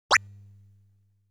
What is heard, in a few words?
Bullet Scales Launch Phase; Dragon Breed